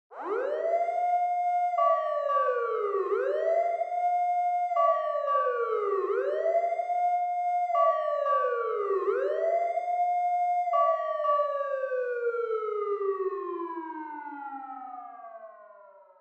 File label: Fire Truck Siren Far